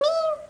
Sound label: cat; kit; SwiftCats